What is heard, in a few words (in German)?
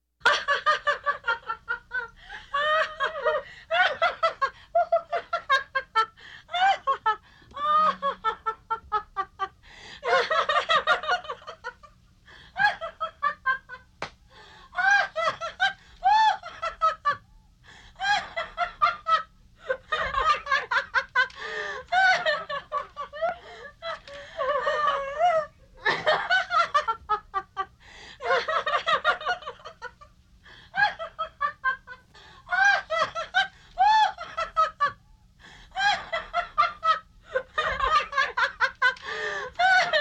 Lachen Zwei Lachende Frauen